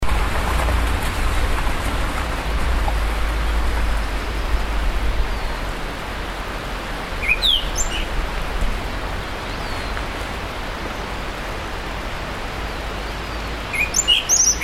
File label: thrush himalayan forest Zoothera salimalii